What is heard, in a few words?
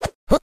symbol pass; jingle spin